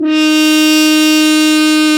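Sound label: brass, F.Horn Solo; Roland L-CD702; F.Horn 4 Ln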